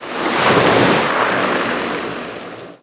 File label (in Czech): Vlny; wob2 demo